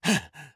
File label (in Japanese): 攻撃